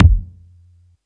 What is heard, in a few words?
loud kick drum f key; kick drum samples